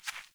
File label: Hare Footstep, Foley; Animals; Ambient